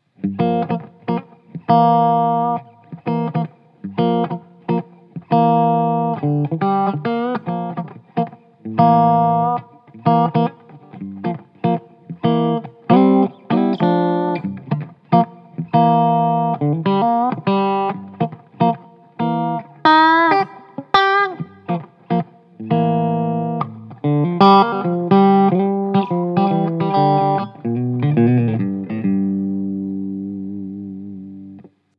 Tube Engine clean; Mooer

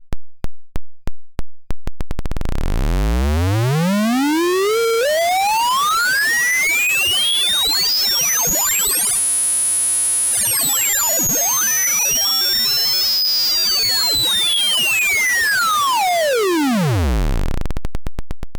Behringer Neutron LFO Aliasing

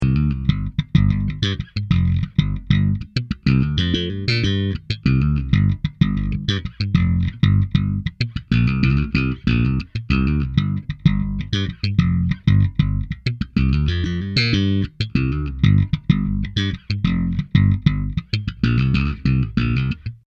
slap

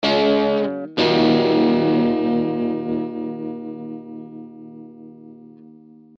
guitar amp british sound

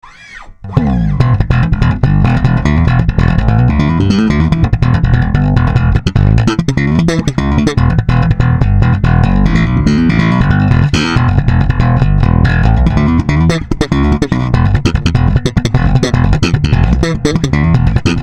Compressor; Deepressor

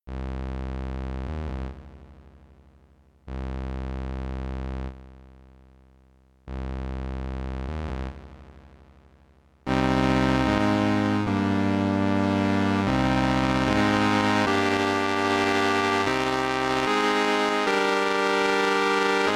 strings filter

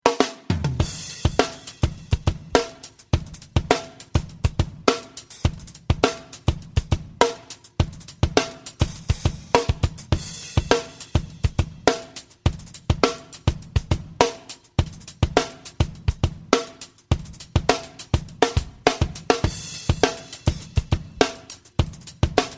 Germanium Bias Low

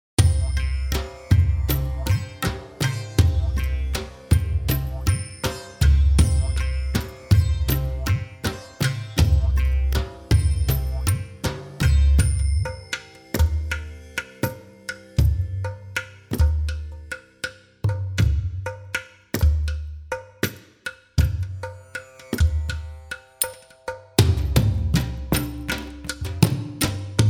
India Ensemble

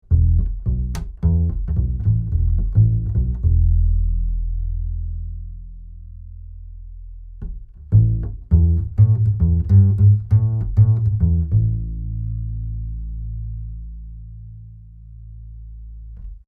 BASS; DPA 4099

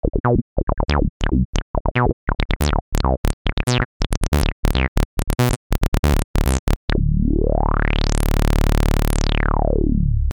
Saw

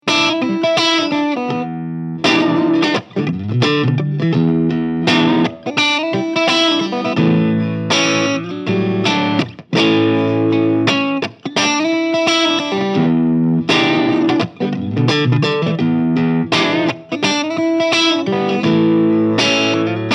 Marshall 1959 Clean; Tuned

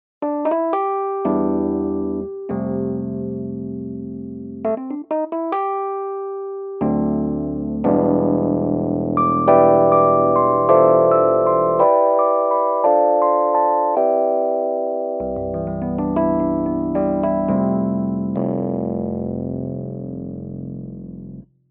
Electro 6 E Piano

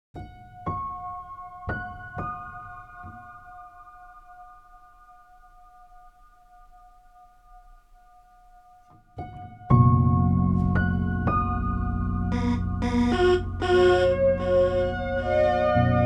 Ambient dry 44k

